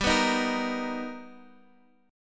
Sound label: B Augmented Major 7th G, chord